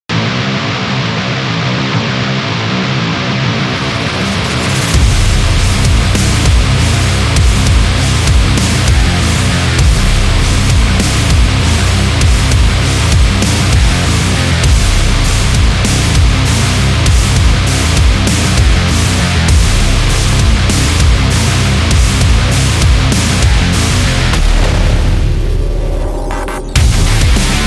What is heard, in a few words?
Brutal Metal